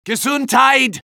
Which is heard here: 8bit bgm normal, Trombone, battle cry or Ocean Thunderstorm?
battle cry